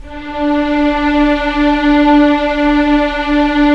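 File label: Strings Violins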